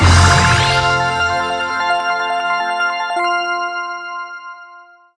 medium Win; spacewars

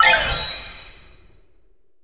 candy Fall High; fairyhansel

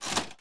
spin Button Down; colossal pinatas

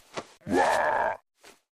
blue Win Elbow; wild worlds

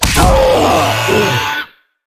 fs Boss Hit Heavy; wild worlds

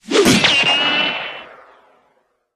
mediumWinFS; wild wild west

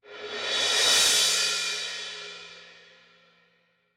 Cymbal Roll; knight rider

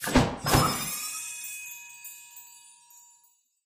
big win text change; cashomatic

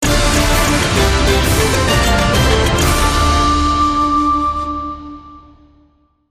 outro Free Spin; warlords

